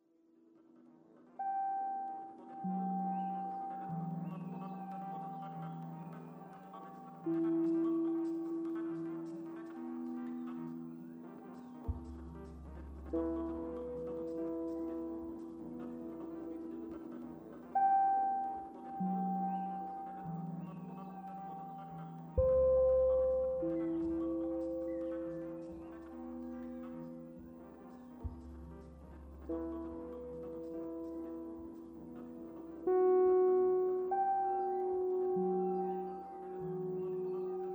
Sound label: guitar; G2